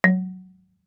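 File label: Marimba deadstroke fortissimo Gb4; MIS Pitches - 2014; Percussion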